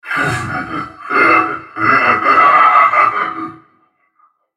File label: laugh; velKoz; en US